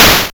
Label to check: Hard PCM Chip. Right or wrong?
Right